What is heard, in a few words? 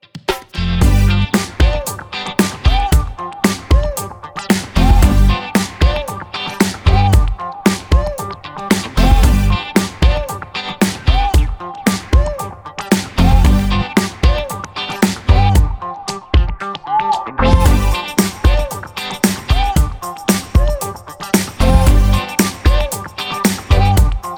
114 BPM KPop; Yum Yum Cookstar